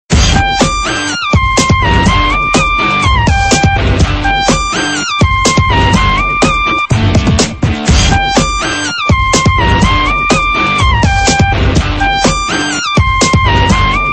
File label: tone